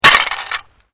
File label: GLASS SHARD SMASH; Material components for constructing more complex sounds; Materials